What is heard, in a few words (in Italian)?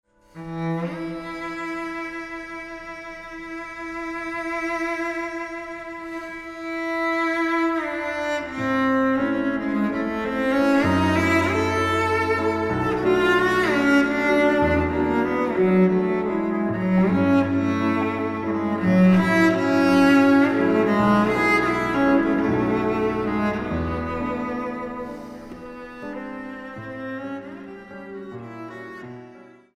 Suite per violoncello e pianoforte Romanza; Alessandro Longo